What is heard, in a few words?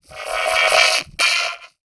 VOICE FX, Dance Vocals